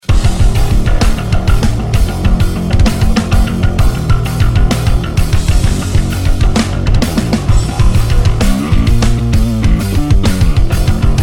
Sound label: Bass Drive